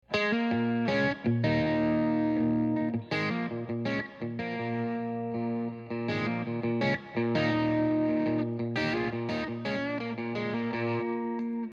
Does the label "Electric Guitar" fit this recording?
Yes